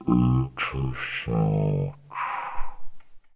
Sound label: auto search; freetennis